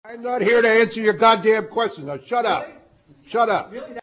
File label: Ringtone